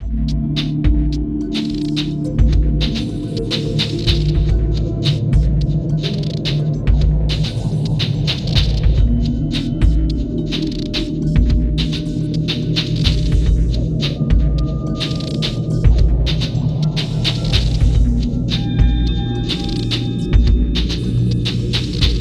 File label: tension